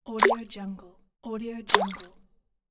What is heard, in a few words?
small water splash